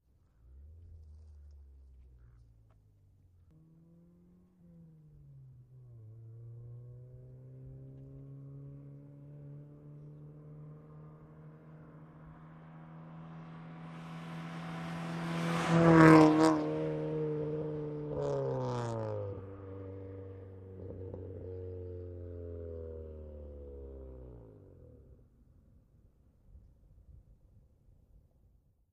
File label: Integra, distant approach, medium fast by with shift decelerate, Acura Integra